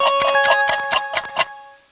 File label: Sfx Warning End Of Day